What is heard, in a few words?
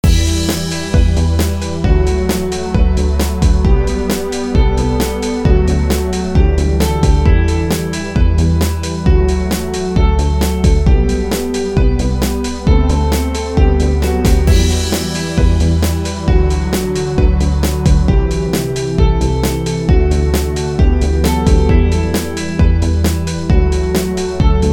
techno